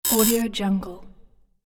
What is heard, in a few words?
wrong answer buzzer notification